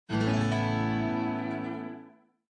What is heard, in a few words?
Windows Logon Sound